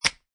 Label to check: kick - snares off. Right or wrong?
Wrong